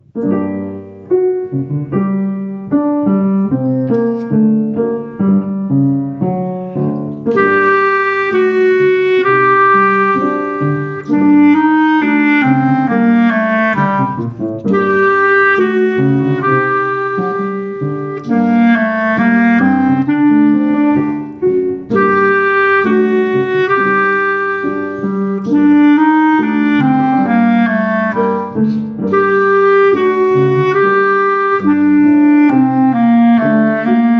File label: Winter with Solo